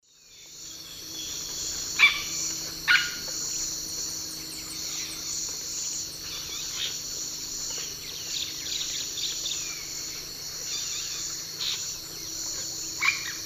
Amazona amazonica, calls; S. America; Eastern Lowlands

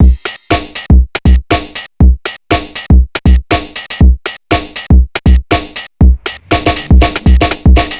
DESIGNER DANCE TOOLS

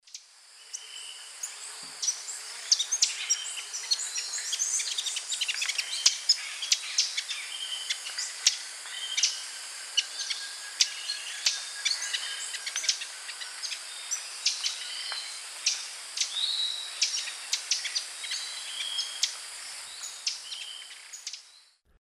Tangara chilensis, feeding calls; S. America; Eastern Lowlands

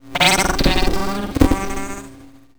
BLIP

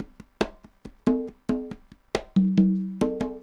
congas 140 bpm, latin; Sound Effect Library

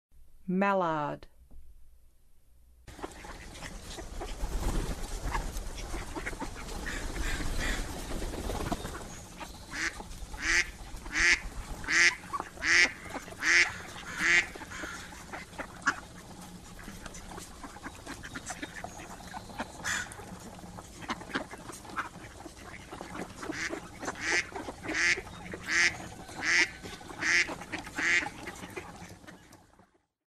Mallard, Western USA Songbirds; North America

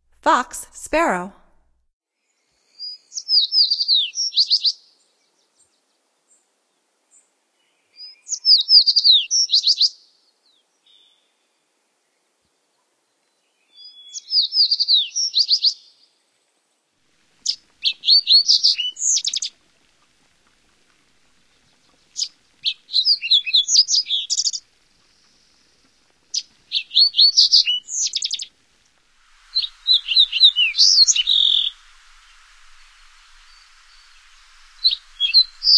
Fox Sparrow, Songs of Birds of North America; Stokes

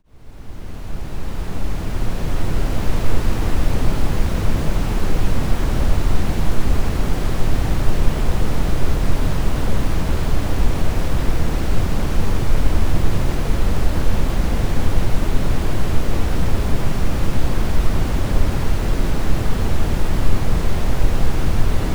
Mono brown noise; Ayre Acoustics, Inc; Ayre System Enhancement Disc

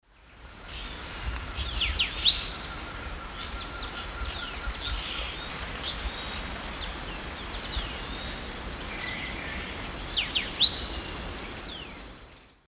Icterus chrysocephalus, calls or song; S. America; Eastern Lowlands

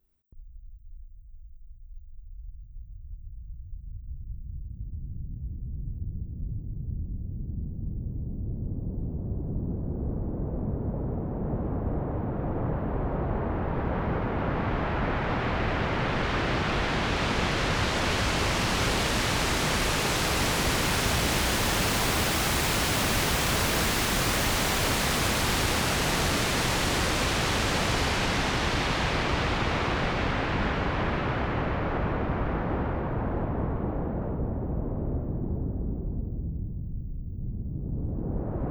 Equipment and Cable Burn-In Signal

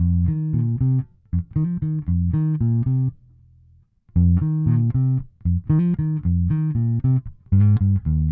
Bass Hip-Hop

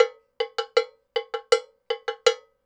cowbell 80 bpm, latin

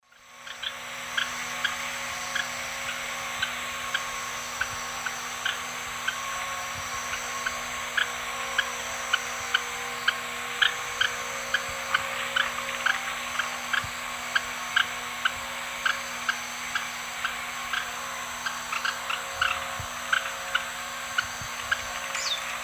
Synallaxis albigularis, scold; S. America; Eastern Lowlands

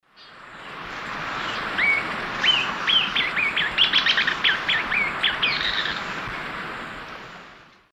Stigmatura napensis, song; S. America; Eastern Lowlands

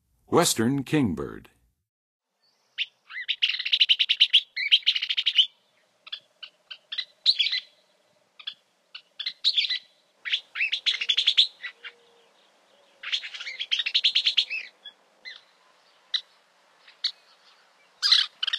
Western Kingbird, Songs of Birds; North America; Western USA; Western USA Songbirds

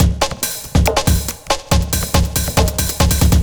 ind 140 kit

